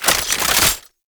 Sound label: Bayonet Equip Primary; Warframe; Tenno